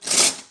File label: tools